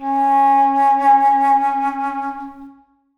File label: alto flute c#4; Sonatina Symphonic Orchestra